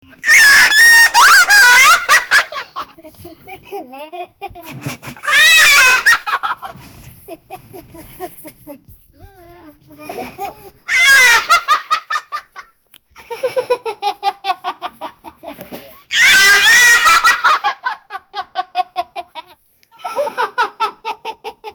a 28 year old man, backyard

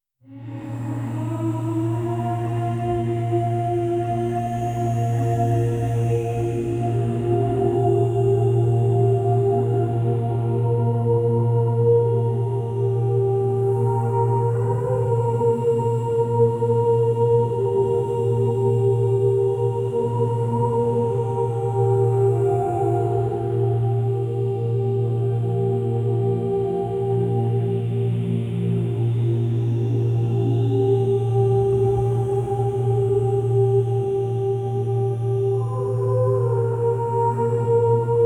historic ambience with; historical

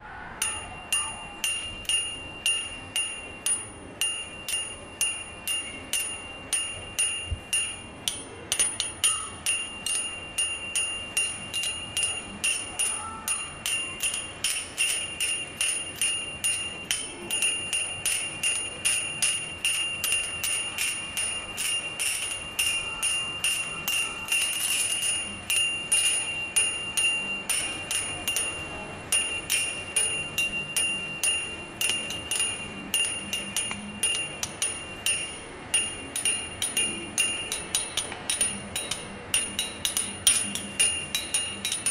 metro bumming style, city life

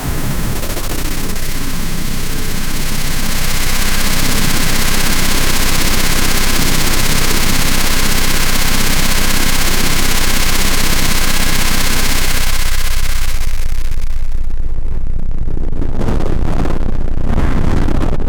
generate a high impact vfx

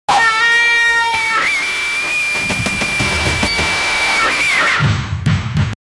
samurai battlecry

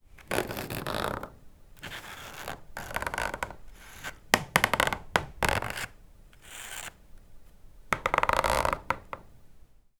texture

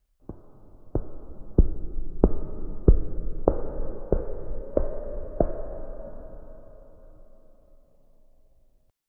slow echoing footsteps; atmospheric

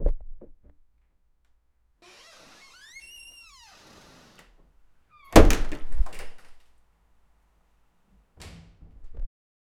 a door is opened and

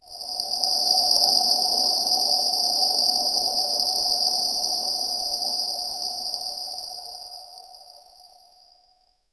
light magic particles

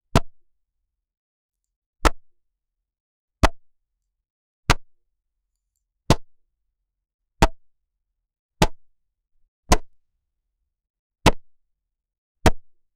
meat hit by axe

